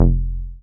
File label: 303, TB-303 BASS